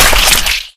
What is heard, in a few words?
zombie blood spray; player; splat; source engine; left 4 dead 2; survivor